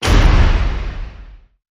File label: Hit Big; Rust Chips